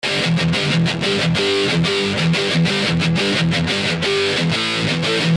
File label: Stereo El Guitar After Heavy